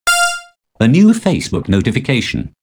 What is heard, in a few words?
new fb note